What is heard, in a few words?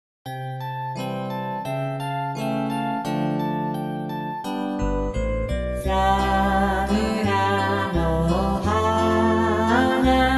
chor